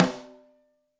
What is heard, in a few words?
Top, Snare